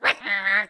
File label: Bird Misc